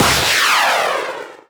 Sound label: missile; gmod; lvs planes; weapons